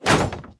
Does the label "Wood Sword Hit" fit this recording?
Yes